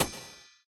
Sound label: Map arrive; Puzzle Chronicles; PS3; PSP; X360